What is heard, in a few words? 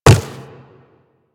Quadruped Medium Walk Dirt, footsteps